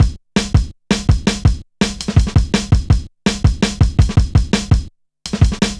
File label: Persistent, Jungle, Drum Loops 165.5